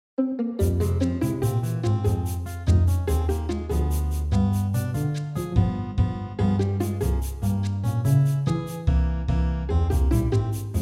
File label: Bossa Pizzicato